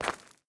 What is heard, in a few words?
dirt concrete footstep; adams venture origins